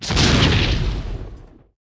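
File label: explosion small generic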